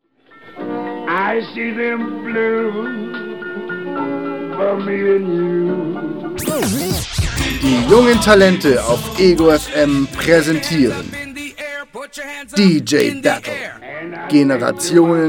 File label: dj battle jingle